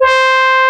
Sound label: FM FLUGEL C4, HORNS; AKAI S-Series CD-ROM Sound Library VOL-2